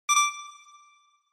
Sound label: Accent